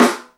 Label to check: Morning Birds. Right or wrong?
Wrong